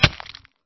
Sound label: hurt flesh, damage; eaglercraft-1.5